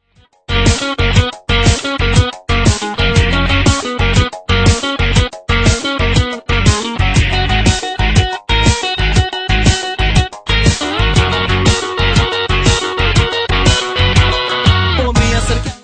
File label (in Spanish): La Guitarra